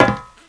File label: grate, player; Group Mapping Project